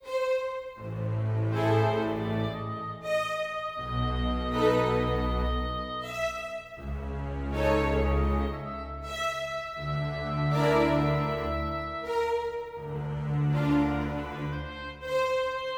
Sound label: dark forte; Quivr; PS4 Windows gamerip 2016